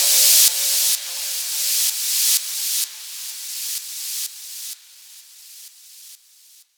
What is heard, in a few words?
FX Downlifter